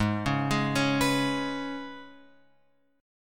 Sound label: G sharp Minor Double Flat 5th G sharp; {4 4 6 6 X 7} arpeggiated